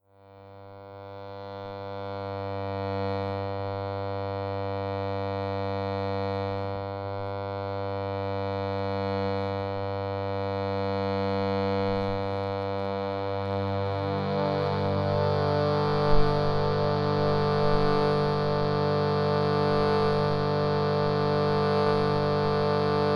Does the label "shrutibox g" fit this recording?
Yes